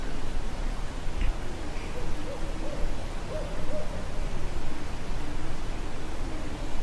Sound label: owl call